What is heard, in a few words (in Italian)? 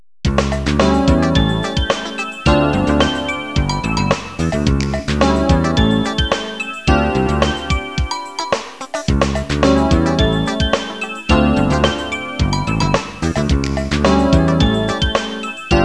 suonerie businessy